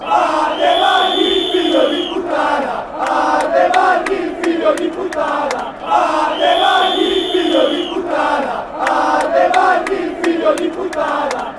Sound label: chants